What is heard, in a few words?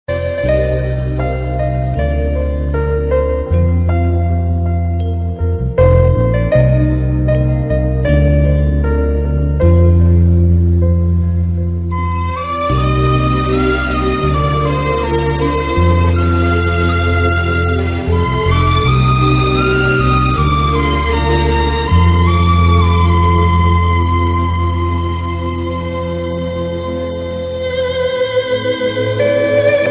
sad bgm ringtone tamil love bgm ringtone; vaaranam aayiram